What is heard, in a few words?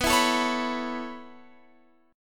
B 9th Suspended 4th B down Guitar Open F; B9sus4; strummed